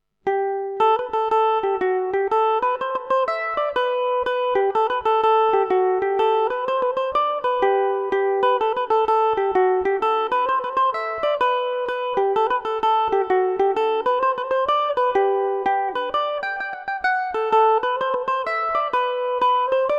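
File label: clog G major revelation revisited, mandolin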